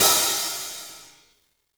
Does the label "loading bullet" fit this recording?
No